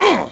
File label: Ranger Pain